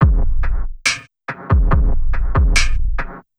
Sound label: Drums large bass